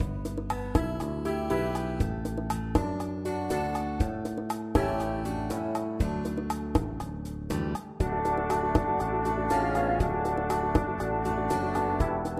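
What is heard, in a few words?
VLC karaoke, Cello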